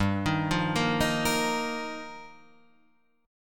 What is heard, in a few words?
G Minor 7th Sharp 5th